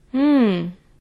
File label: hmm